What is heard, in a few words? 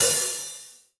Gretsch Hi-Hat Open; Sonic Foundry (Sony Creative Software); Vital Drums